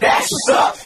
"that's what's up", crowd

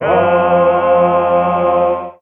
scots, Voice; Notes

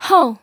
"ho" female, voice

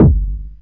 dunk, Kicks Electro

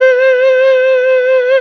falsetto, Voice; Notes

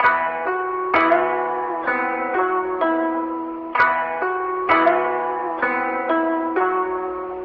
guitar

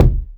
Kicks Loud

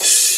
Cymbals

